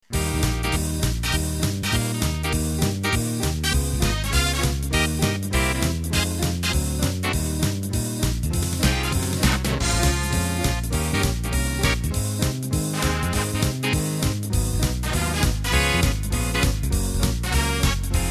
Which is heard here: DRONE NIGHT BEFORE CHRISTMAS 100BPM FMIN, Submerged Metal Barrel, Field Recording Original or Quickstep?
Quickstep